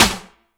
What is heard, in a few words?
Snare, drums